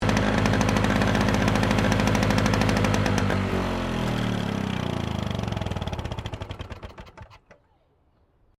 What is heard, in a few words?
LAWN MOWER SHUTDOWN, Old lawn mower; Victor; Machines